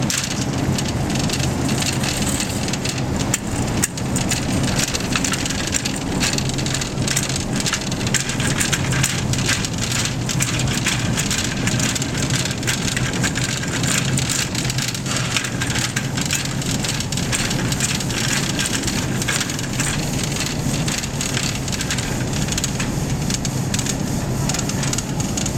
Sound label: Buggy Thru Produce Section